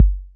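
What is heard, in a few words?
808 bd, bass drum, drum machines; 808 Extended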